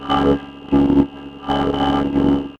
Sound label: vocoder